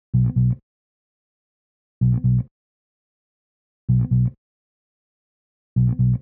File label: bass break